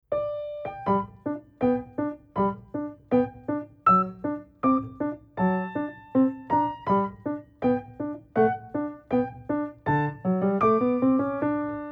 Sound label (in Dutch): Aria, Piano oefeningen; Aria uit La Cenerentola